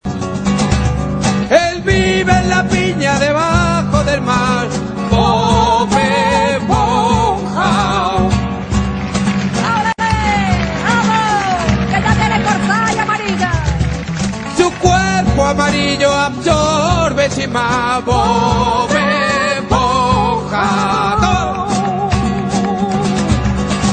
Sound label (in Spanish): flamenco cancion